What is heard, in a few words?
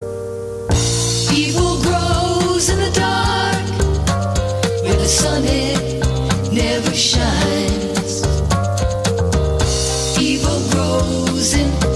Evil grows chorus